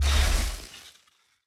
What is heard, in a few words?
pulse, fight